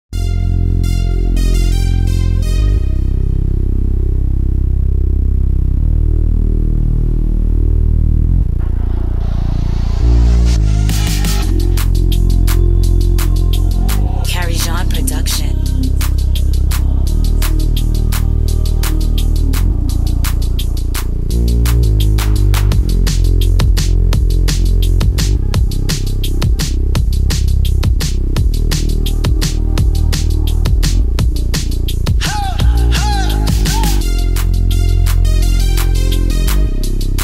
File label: Instrumental